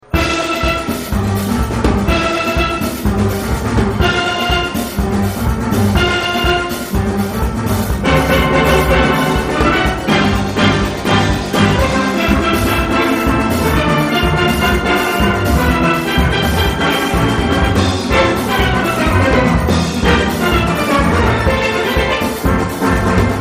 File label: Tenor Bass